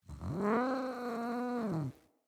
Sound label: Feral Vocal Idle; Catbrow